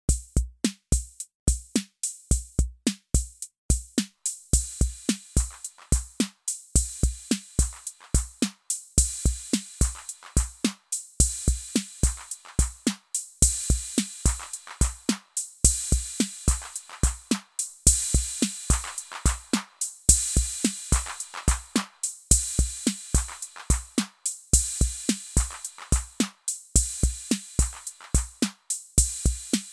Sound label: RD-6 Cymbal Clap Volume